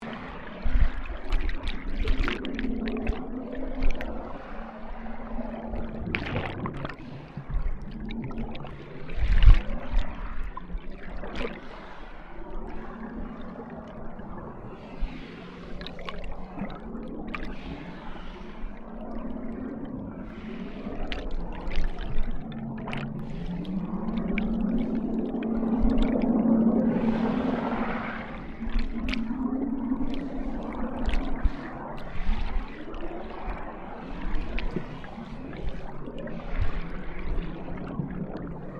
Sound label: BOAT CUSTOM ENGINES OPERATING SUBMERGED UNUSUAL LOOP; small resteraunt boat; Boats; Vehicles